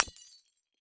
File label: Crash Light Bulb Break On Cement; crashes; AdobeCC